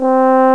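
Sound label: french horn c4; Star Trek demo; Star Trek Audio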